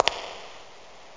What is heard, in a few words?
foot revb; alienbreed3d2